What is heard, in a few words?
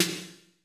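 wet room snare 808; Masterbits - TR-Minator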